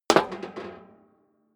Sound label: step high heels metal rattle single stair up; schritte